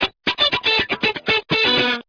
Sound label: clean funk, guitars